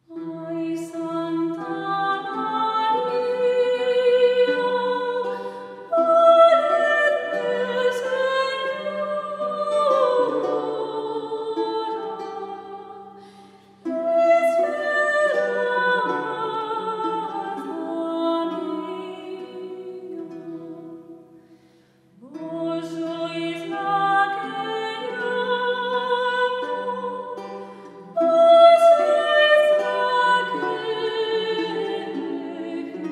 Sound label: Grup Psalterium; Esglesia d Urueña